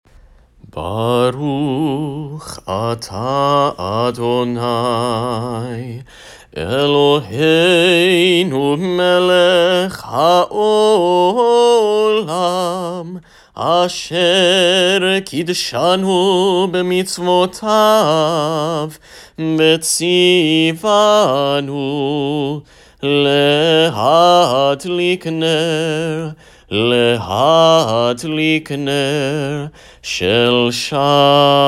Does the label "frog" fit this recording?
No